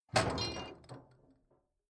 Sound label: Farm Cattle Handler Crush Soft Metal Impact High Pitched Ring Out